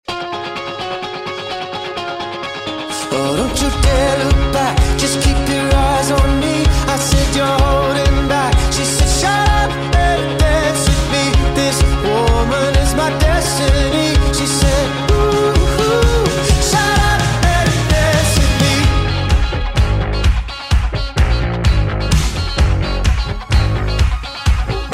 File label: Dance, music